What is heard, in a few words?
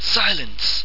silence